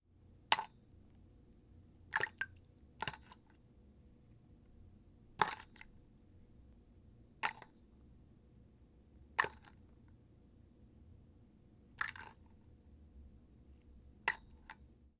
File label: Dropping Coins Into Water